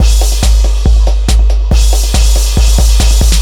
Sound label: TECHNO BEATS 140